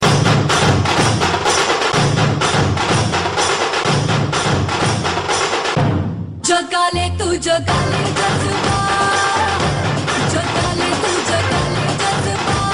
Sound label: Dhol Mix